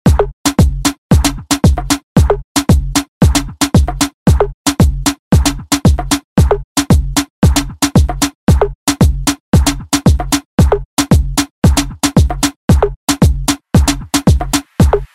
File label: Hit Latin Urban Redrum; Deejay Hit